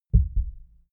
heart beat double quiet

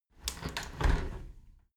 window handle squeak turn close soft; objekte; fenster

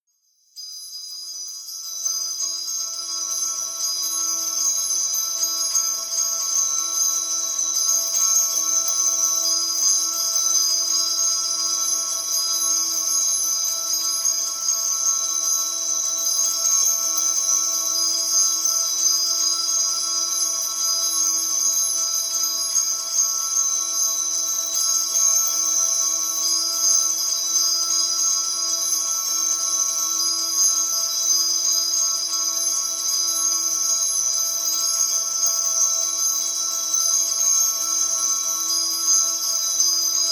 drone high chimes

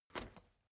step ladder wood scrape single

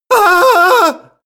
male fearful scream high; schreie; menschen; soundpool

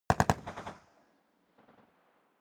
rifle ak-47 exterior fire burst distant; Firearms; schusswaffen

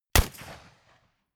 rifle ak-47 exterior fire single clean; comedy-cartoon; Pistols and guns; pistolen-gewehre

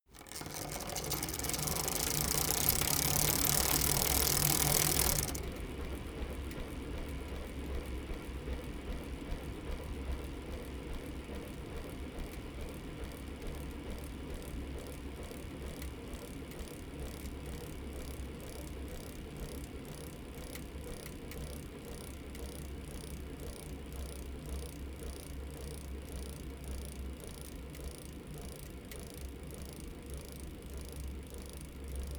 bicycle rickety accelerate low roll out

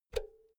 power cord pull out quick; objekte; elektronik

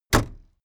car camaro close trunklid

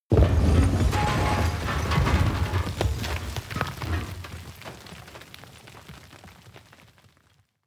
explosion large shrapnel dirt; explosionen; comedy-cartoon; soundpool

